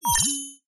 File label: science fiction machine small on activate beep